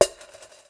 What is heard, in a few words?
Prepared Drums